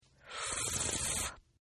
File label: Slurp Female, Drinking; Human